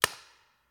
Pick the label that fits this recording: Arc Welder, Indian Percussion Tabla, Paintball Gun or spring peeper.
Paintball Gun